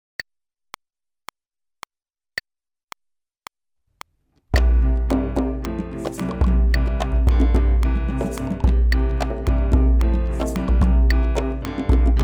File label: Click Track Obwisana